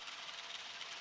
Background Noise Clips

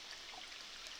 Background Noise Clips

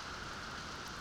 Background Noise

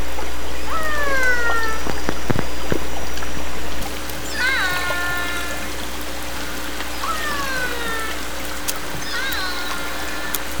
Transient Calls

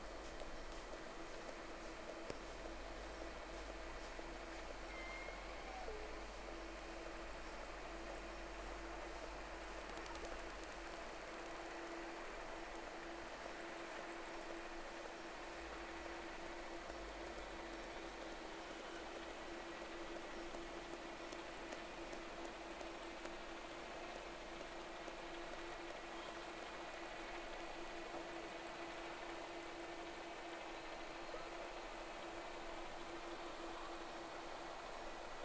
Whales; SeaSnake

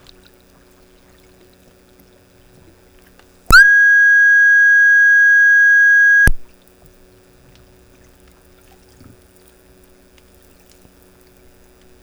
background, hydrophones, alec bay

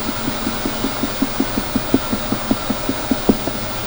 tanker

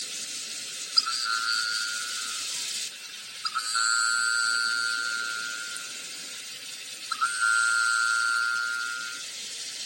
Minke whale

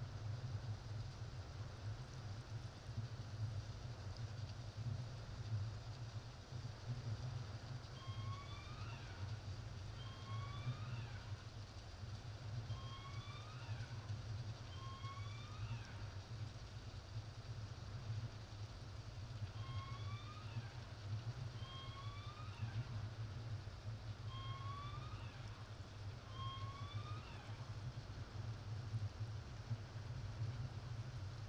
kw playback

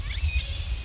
1st call in 1 hour change direction; S2 recorded 9/22/10, (1st call in 2 hours, preceded a change in direction); Beam Reach

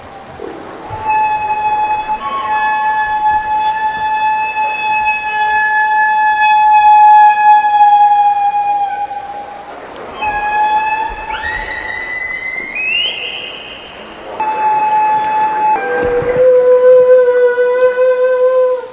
humpback Feeding Call

